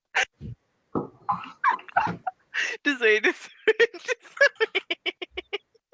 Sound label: walrus laugh